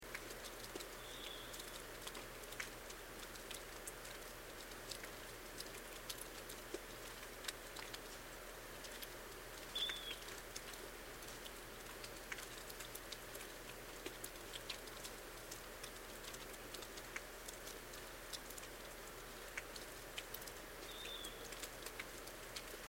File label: mystery call